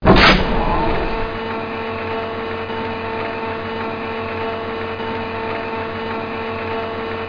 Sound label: Sound open